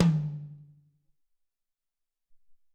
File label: Amb Tom H, Alesis SR-18